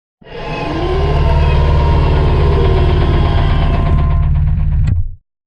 Sideways Town Giant Floor Panel Lower Rotate Servo; Boomtown; Titanfall 2